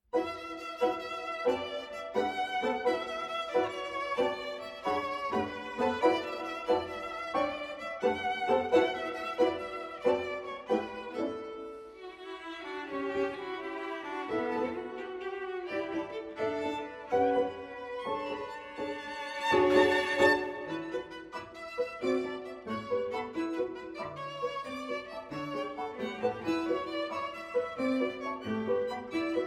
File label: Piano Quartet, Dancelike